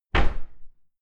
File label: cupboard close hard subdued